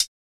soft hit normal

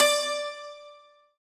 Santoor D4

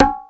bongos high slap

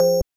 BELL

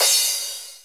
drum cym crash

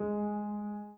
Piano Chord

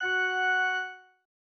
organ

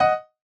chorus chord F#6 D#6

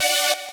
saw

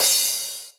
drum cym

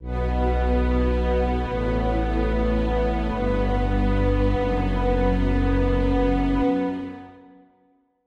Strings

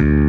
bass

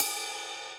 Ride

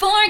vocal

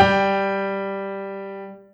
Two G3 G5; osu

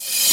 reverse

